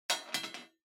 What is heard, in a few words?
Bingo Machine Drop